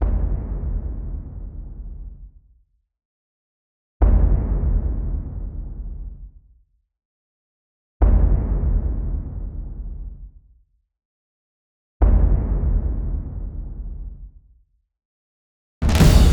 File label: Percussion Only Epic 120BPM; Cinematic Trailer Music; Epic Soundtracks; BlueFX